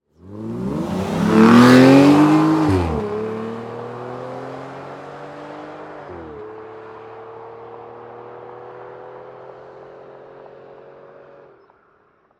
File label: Aston Martin; Blastwave FX